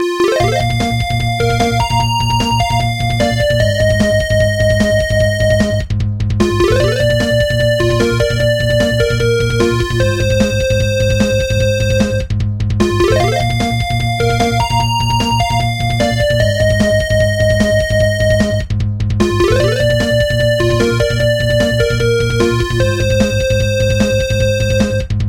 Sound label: Acquired Beat